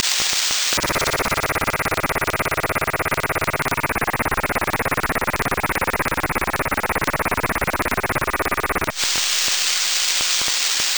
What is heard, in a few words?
TYT-EP, scanner